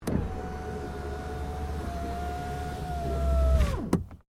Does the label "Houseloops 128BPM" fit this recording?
No